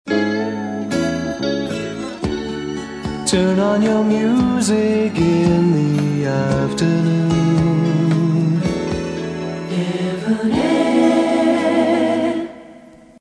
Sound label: music afternoon, idents